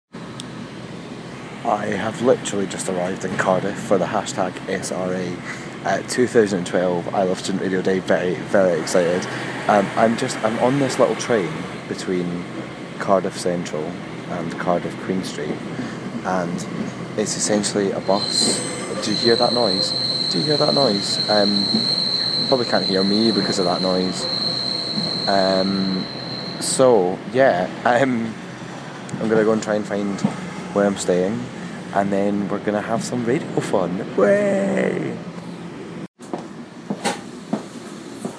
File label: squeaky train